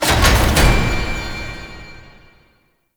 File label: Door Generic Open; Warframe; Shadowgrapher; Ambience